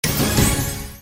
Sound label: service special stop; Full Of Luck